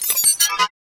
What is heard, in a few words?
Glitch